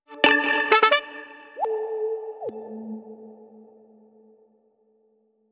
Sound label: win